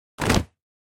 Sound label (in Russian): zvuki pryzhka s parashiutom